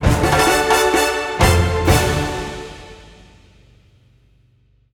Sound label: Rihnos jingle